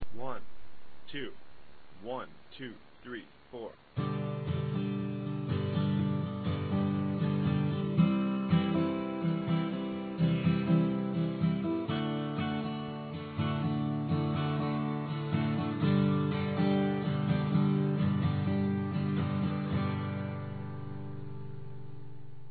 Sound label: strum, guitar acoustic